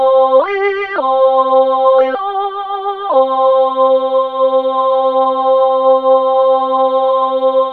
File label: OH BOIZ; MORPHEUS